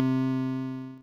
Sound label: synth, amiga med